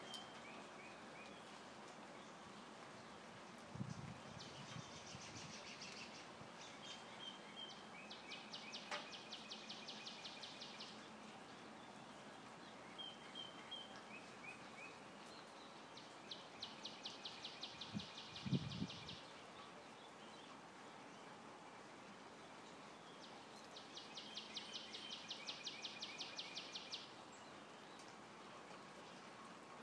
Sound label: rain birds